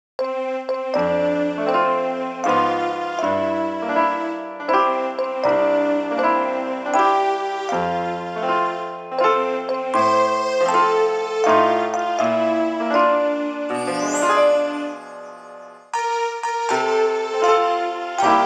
Happy Birthday! (Toy Piano); Nintendogs + Cats

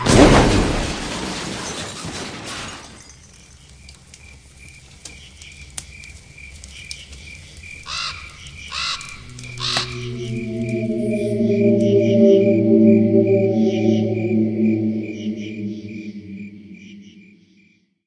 opening score; escape from frankensteins tower

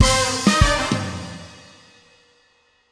Disco Stinger Stab

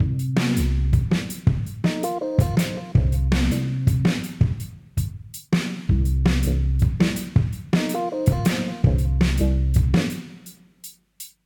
DnB Test Song low; hot lava; ios macos windows

